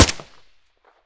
ak74u silencer mono; STALKER; IWP

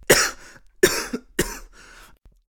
coughing; STALKER; IWP

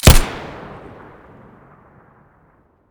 muzzle colt1911 stereo

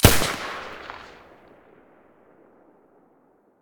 silencer mp9 stereo, weapons

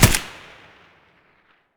an94 muzzle distant; STALKER; IWP; abakan

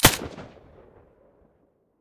silencer; S.T.A.L.K.E.R.; IWP; vityaz; pp1901

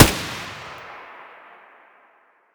aug muzzle mono distant, weapons; STALKER; IWP

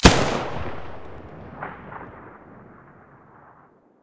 muzzle sa80 stereo

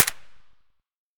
akm silencer distant, weapons; ak103; STALKER; IWP

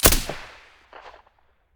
vss silencer stereo, weapons

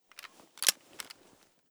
m203 activate, weapons

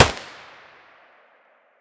mp5 muzzle mono distant, weapons

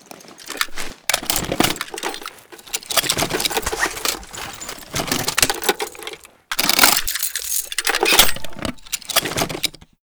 pkm reload, weapons

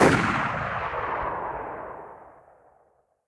sks muzzle mono far

